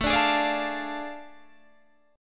A Major 7th Suspended 4th Sharp 5th, Guitar, Standard; AM7sus4#5; strummed; JGuitar